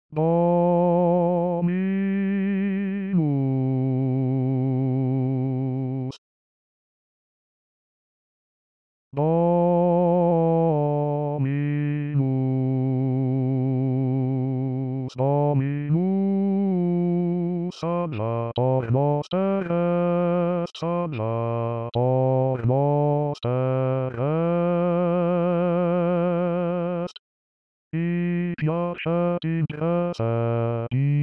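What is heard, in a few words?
bass; praetorius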